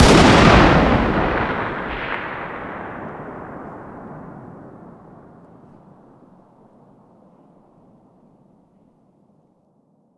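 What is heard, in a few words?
fire distant 338lm rifle exterior; gmod; arccw uc commons attachments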